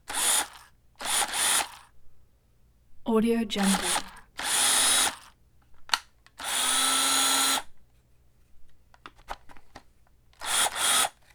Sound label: small servo drill; mechanism source; soundmorph